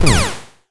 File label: blaster; Starter-Kit-FPS